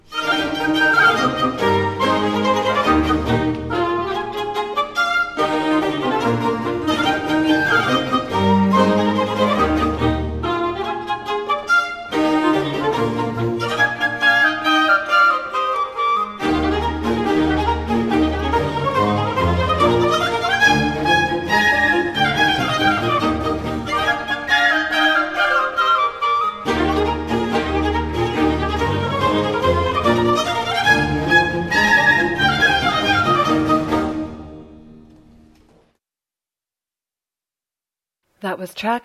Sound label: Air Gai; Suite from Les Fetes D'Hebe; Rameau